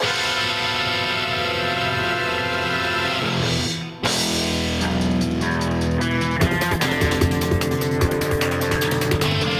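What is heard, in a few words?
Elevator, music; bolt 2008 pc gamerip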